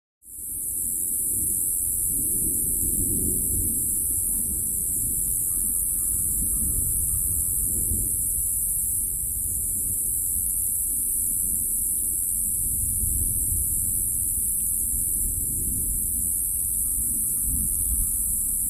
Continuous Cicada Ratchet With Close Perspective Exotic Birds And Distant Thunder. One Fly Past Mic At Close Perspective.